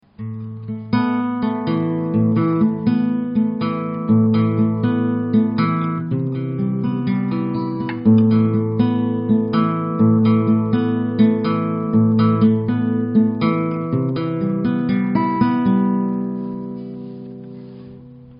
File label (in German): Gitarrenlick